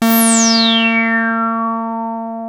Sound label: 303 A3, TB 303